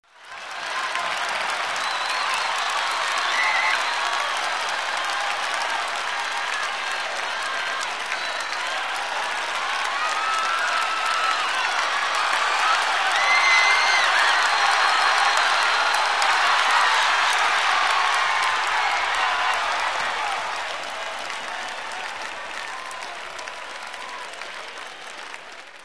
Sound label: Standing Ovations